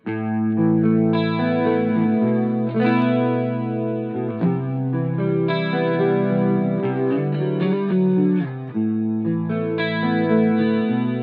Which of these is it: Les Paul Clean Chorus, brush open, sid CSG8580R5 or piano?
Les Paul Clean Chorus